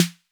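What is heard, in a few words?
Roland 808 snare drum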